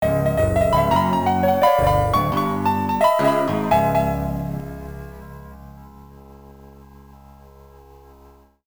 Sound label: Logo; street fighter 25th anniversary collector's box